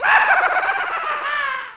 Sound Ricanement de fou

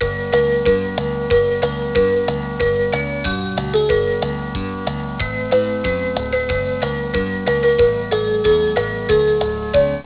Vive le vent, Christmass, Musiques MIDI

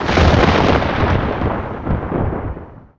Tonnerre

